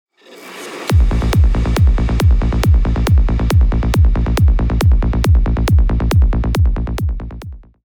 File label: kick bass; sound design